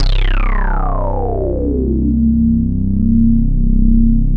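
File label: WOW, left; East West - Phat & Phunky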